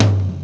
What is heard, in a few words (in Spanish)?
tambor; primartis; sonidos